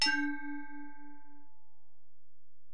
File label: Bell, Alesis DM-PRO